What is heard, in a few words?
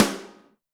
snare, Zoom MRT-3B